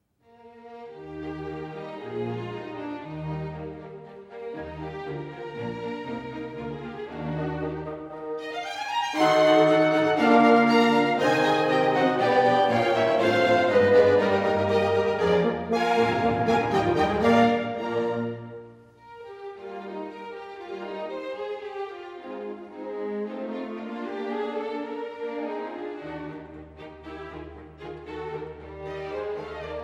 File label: Horn Concerto, Romanza; Mozart